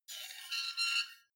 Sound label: two knives large scrape creak